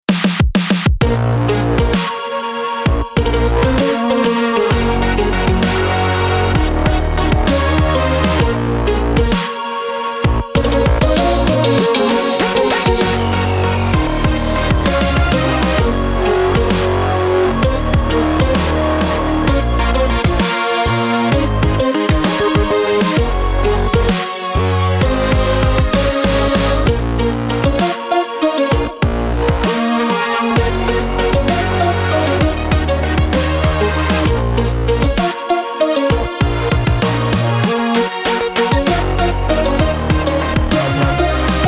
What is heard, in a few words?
Dubstep; Nordigt